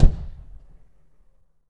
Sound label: LO BOOM; 3D Sound - 3D Keys VOL-1; MEAN 3D